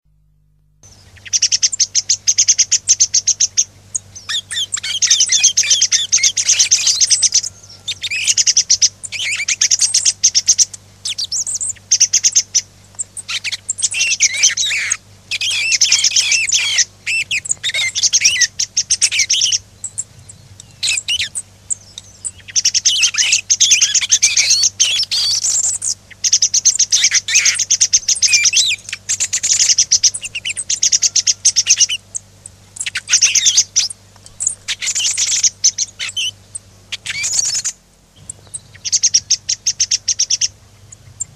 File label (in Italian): TORDO; PUGLIA; CANTI SAG NATURE 2024